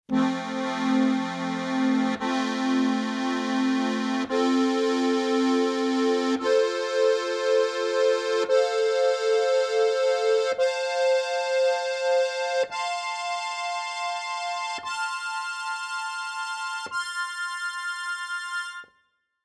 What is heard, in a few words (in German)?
GCFB Akkord, harmonika